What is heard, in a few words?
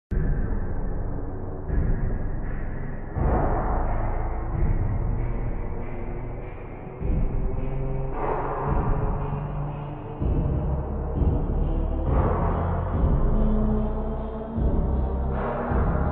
slowed reverb instrumental